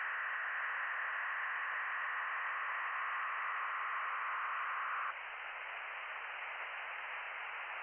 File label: from radio, 7177KHz